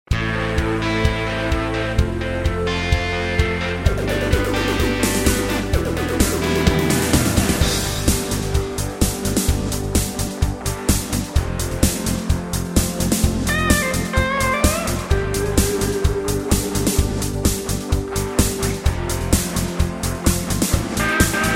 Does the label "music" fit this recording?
Yes